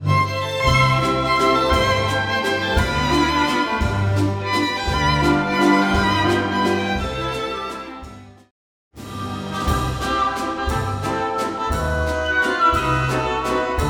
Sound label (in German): Wiener Walzer